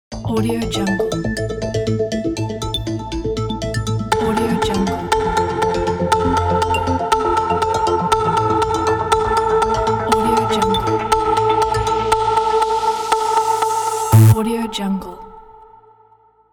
countdown; شمارش معکوس هیجانی مسابقه

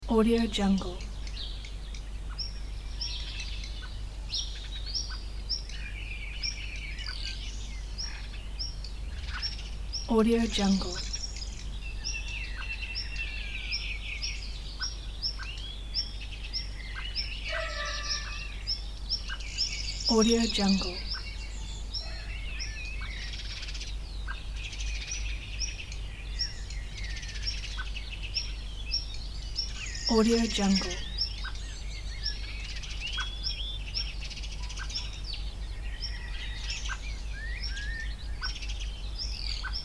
summer countryside morning birds loop; صبح روستا

